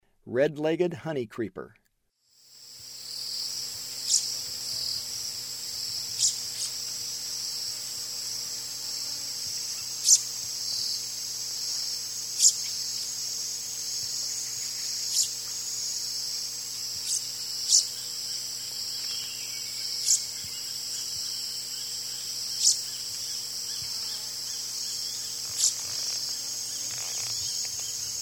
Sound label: Red-legged Honeycreeper; S. America; Eastern Lowlands